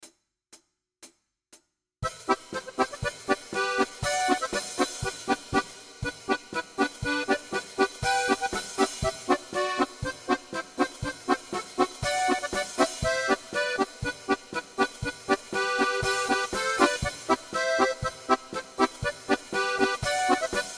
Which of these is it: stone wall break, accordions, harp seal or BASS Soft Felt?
accordions